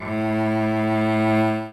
string

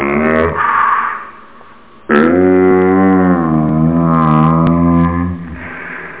vocal

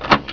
Successful open attempt; Curse of Dragor

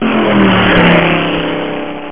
CAR

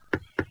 knock; Rayearth

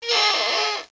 Creak

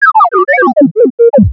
robot death; Earth and Beyond; EA Games

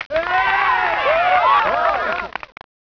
hi score; 501 Great Games - Volume One; Guildhall Leisure Services

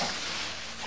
doors; sblaster; sttng

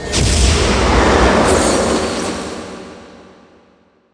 STARGAT

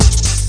rhythm rattle; amiga; shamen

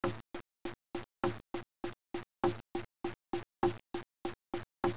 war drum; 10,000 Graphics Pack Volume 1; Media Graphics International

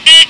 digital beep; Sound Effects for Multimedia - Volume 1; electronic sounds

